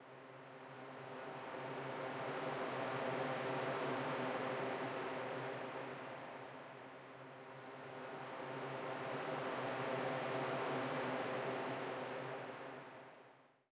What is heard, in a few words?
ambient; World of Tanks